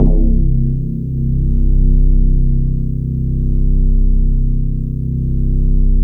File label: E-HARP, DX HARP; Wizoo - Powered DX